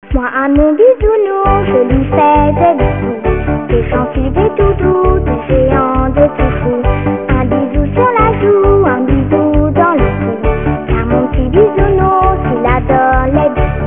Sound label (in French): bisou